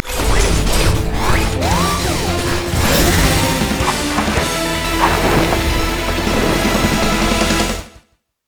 recall leadin; rumble skin03

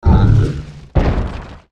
ancient golem dying

